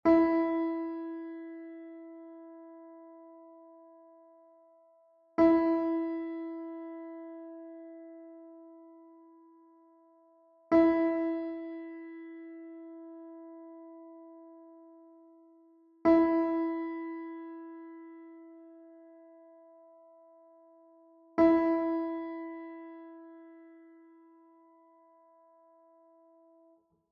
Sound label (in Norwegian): stemmetoner for gitar den lyse e strengen e4